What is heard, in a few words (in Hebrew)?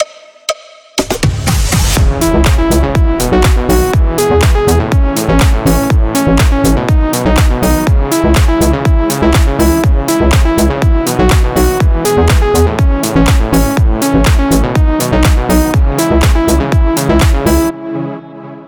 מלודיק האוס